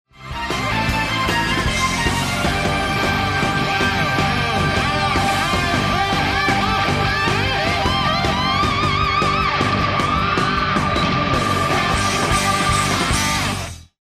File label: choose team; music kits custom